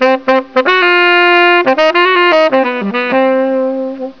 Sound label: Sax; Bunny Killer III